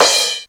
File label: R50 Crash, Kawai Drums